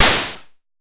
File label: Snare, Pollard Syndrum